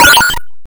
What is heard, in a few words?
Data In Space; FLEETCOM; bfxr